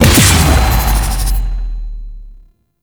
Howl Damage; Warframe; Voruna

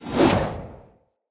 Long Sword Whoosh; Weapons; Mother

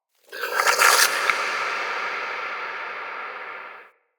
small creature; Ambience; Under City

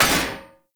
Short Sword Medium Impact Heavy Armour; Weapon Impacts; Player; Swords; Combat

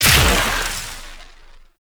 Explosion; Warframe; Moa Pet; Multron

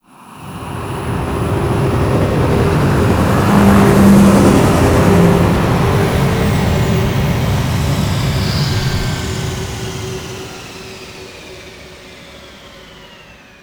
Ship Pass; Warframe; Grineer Settlement

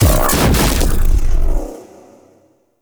Pulse Projectile Deploy Enhanced; Warframe; Gyre

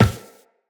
Run Glass, Footsteps; Tenno

